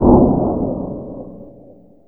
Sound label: Pickup Magic; SFX Kit; Pickups